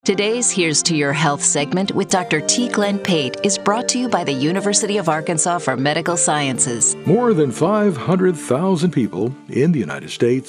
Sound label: organ